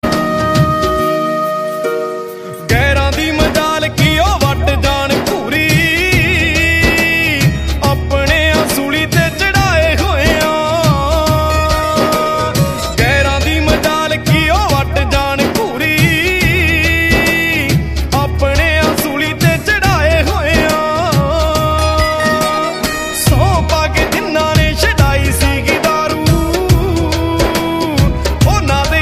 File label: Punjabi